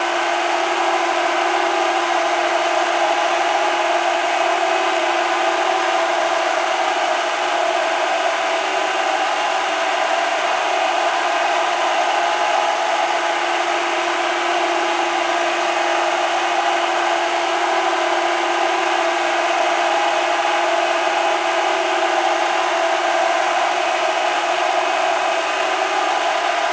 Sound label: television static